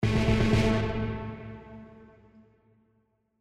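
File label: Damaged Shorts Ostinato Strings; Performers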